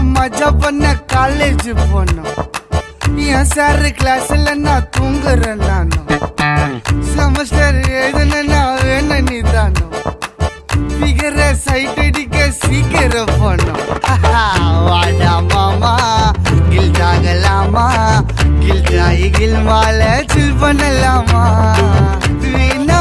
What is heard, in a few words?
gaana ringtone